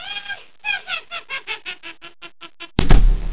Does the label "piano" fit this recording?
No